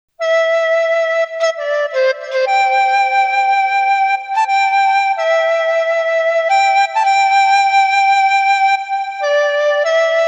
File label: Lonely Vibro; Lonely Vibra patch demo; xp-80; roland; roland demo cd-3